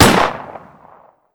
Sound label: fort shot, weapons